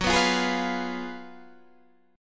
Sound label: F sharp Major 7th Suspended 2nd Suspended 4th, Guitar, Open D